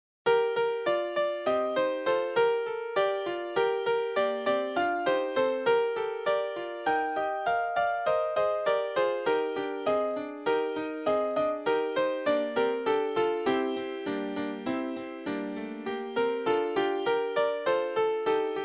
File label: piano soprano